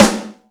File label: rich low end steel snare drum sample g sharp key; Duration 0:01; Samplerate 44100 Hz